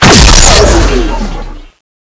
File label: laserfist shoot